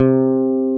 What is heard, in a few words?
DKP BASS PH C3; Sonic Reality; American Heartland